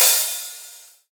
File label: airy open hi hat d key